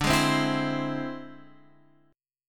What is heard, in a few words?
E Augmented 7th D